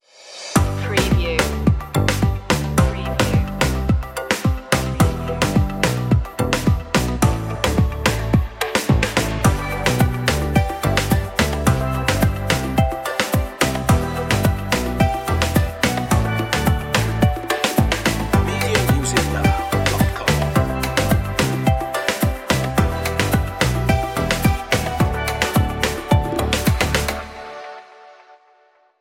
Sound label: tropical fun